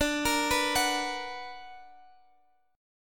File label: B Minor Major 7th